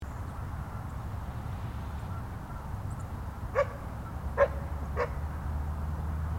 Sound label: dog at park; ann morisson park